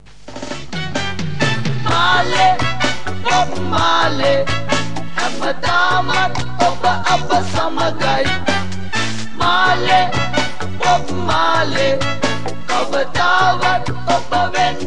reggae

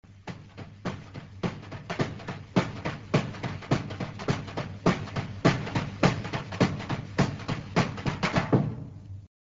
rhythm

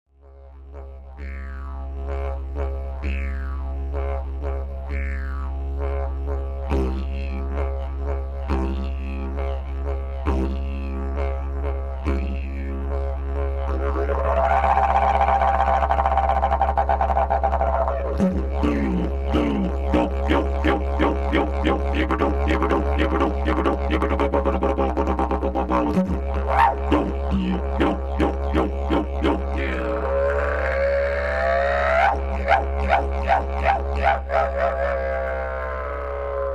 didgeridoo

